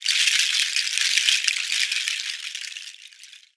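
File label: Ankle Bells, Roland SPD-S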